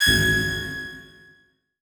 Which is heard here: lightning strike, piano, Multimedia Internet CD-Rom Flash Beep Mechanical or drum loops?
Multimedia Internet CD-Rom Flash Beep Mechanical